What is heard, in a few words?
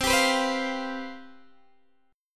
C Major 7th Suspended 4th, C, down, Guitar, DADGAD